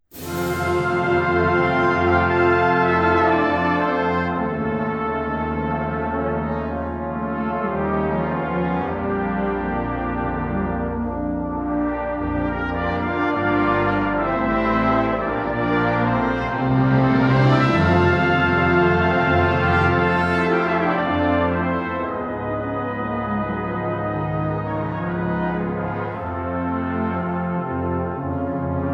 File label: Brass Band